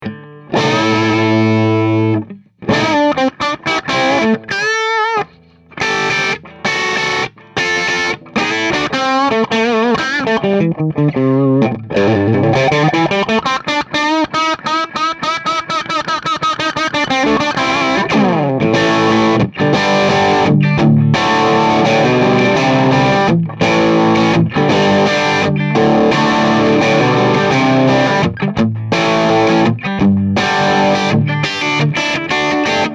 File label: attenuator; '71 Les Paul Straight into Traynor -- Scumback H75 Speaker